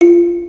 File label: Model Build